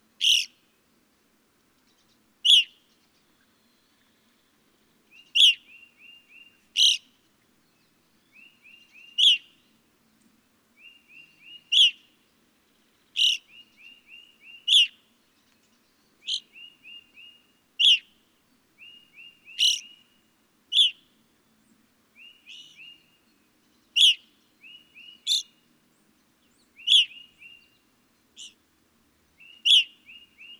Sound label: Evening Grosbeak